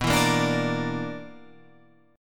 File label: B-Minor Major 7th Double Flat 5th